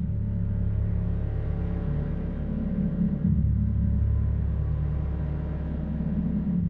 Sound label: Ambient Kill Door; Game rip; MiSide